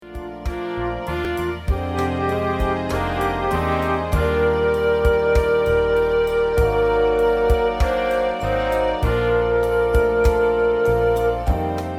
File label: woodwind